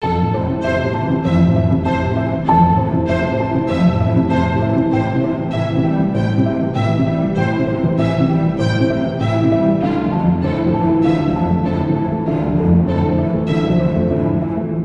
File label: Relaxing Piano Strings